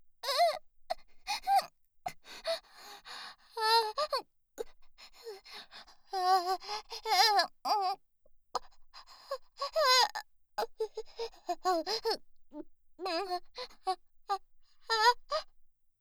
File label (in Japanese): 喘ぎ声(小)